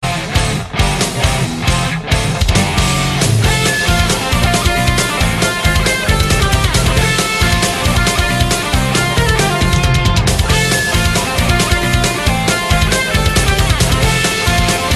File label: overworld theme on electric guitar; super mario world